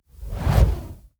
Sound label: swoosh